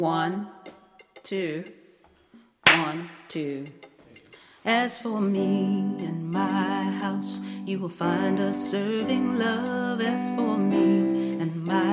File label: Alto melody; choir; freedom choir